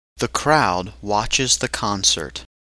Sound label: crowd